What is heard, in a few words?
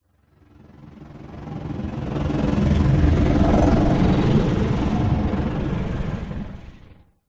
futuristic vehicle passby animation particle swish swoosh accent logo sci fi futuristic; alien